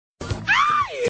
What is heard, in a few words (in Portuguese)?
vinheta na praia gritando aiih; panico na tv; richarlyson